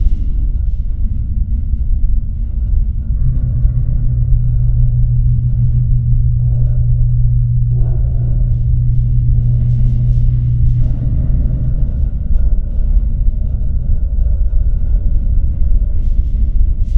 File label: spy orb destroyed; Stellaris; utopia